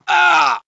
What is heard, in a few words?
death; cj